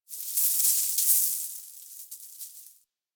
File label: Mountain Pass Snow Hit Player; Ambience; Warframe; The War Within